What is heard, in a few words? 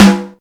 Natural Acoustic Snare Sound G Key, snare sound tuned to the G note; snare drum samples